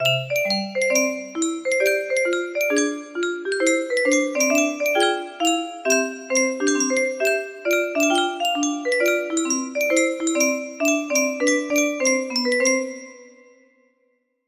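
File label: swing lullaby, music box melody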